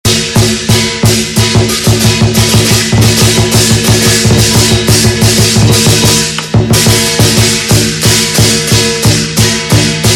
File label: chinese new year music lion dance